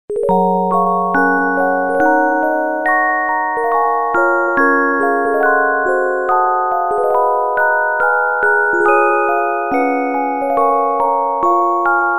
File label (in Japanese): オルゴールの城のテーマ16和音着信音